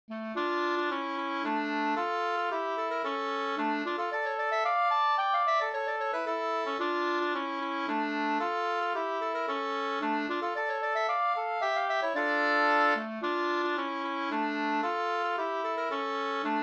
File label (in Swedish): polska d moll